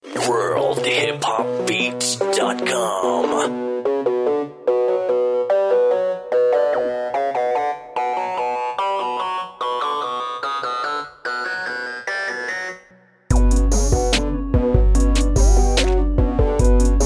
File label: 73bpm